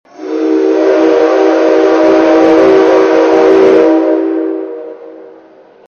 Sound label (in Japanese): kiteki; koshien 2009; travel